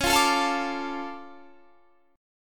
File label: D Minor Db, chord; Dm/Db strummed